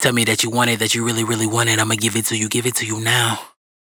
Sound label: hook, Vocal, Dry Vocals; Raw Vocals; Tell Me That You Want It; Slate Digital Omnivox Vocal Sample Pack